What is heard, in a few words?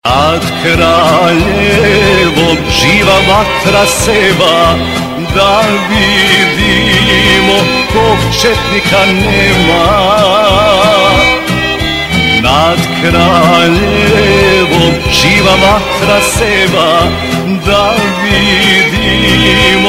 War Song; Chetnics